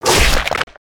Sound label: scratch, creatures organic